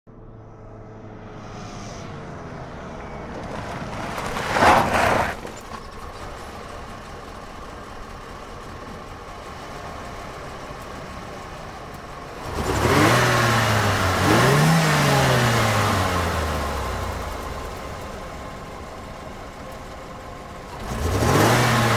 Lincoln Town Car Long In Stop Revs; Cars

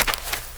DIRT, FOOTSTEPS; 1000fx

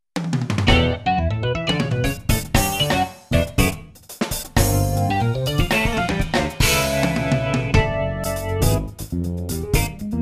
E.Piano; SD-90 MIDI

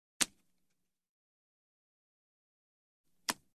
Card Playing Card Flip Over; Hollywood Edge Foley Sound Library

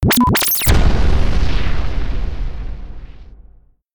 impact

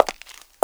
pavement, footsteps; 1000fx

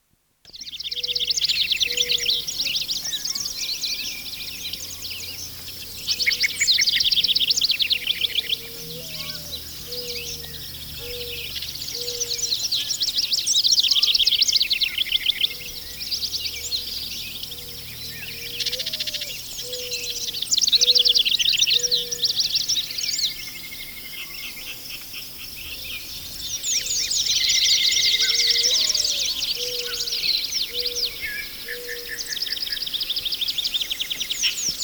Owl Hooting In the Forest (With Morning Doves); Dr. Sound Effects; 210 Nature Sounds - 20 Hours of Relaxing Natural Ambiences for Meditation and Sleep

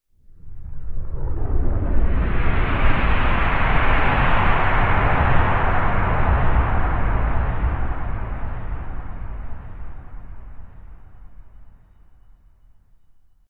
Sub woofer - Rumble, with steam whoosh; Hollywood Edge Sound Designers Tool Kit

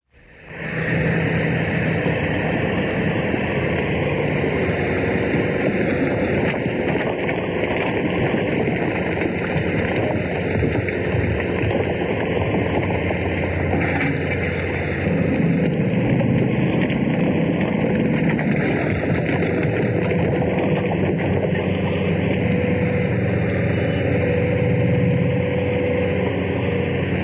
Bulldozer; SOUND EFFECTS1

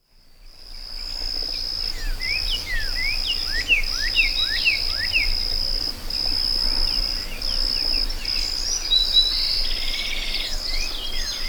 A Meadow With Birds and Crickets; Dr. Sound Effects; 210 Nature Sounds - 20 Hours of Relaxing Natural Ambiences for Meditation and Sleep

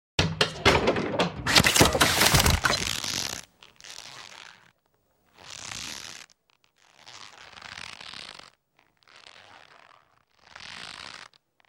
Hanging Sequence; Hollywood Edge Historical Series

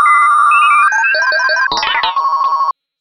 tones

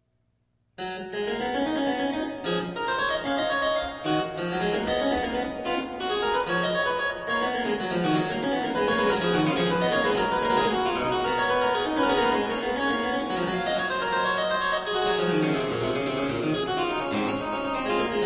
Variatio 27 Canone alla Nona a 2 Clav; Bach Goldberg Variations

